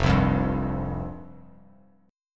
F Suspended 2nd F; Fsus2 strummed; JGuitar

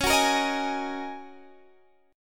Ab 7th Suspended 2nd Suspended 4th, Db

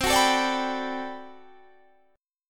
D 13th C

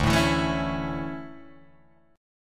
D Major 7th Suspended 2nd Guitar Drop D, chord

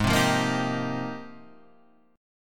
F Minor 11th, guitar chord

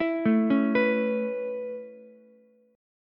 A Major Add 9th A Ukulele C6, chord; Aadd9 arpeggiated; JGuitar

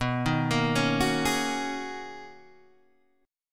B Major 13th B down Guitar DADGAD

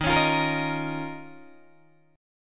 Eb 7th Suspended 2nd Eb down Guitar DADGAD; strummed

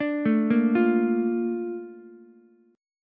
Bb Major 7th Ukulele, chord; BbM7 arpeggiated; JGuitar

